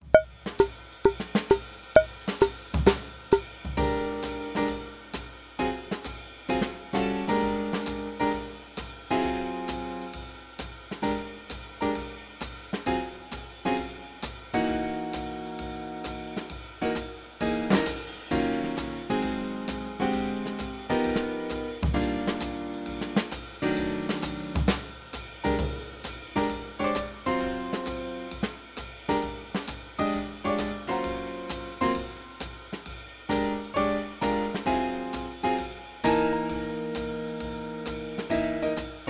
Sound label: Improv Backing Track Subtonic Blues NO BASS; for bassists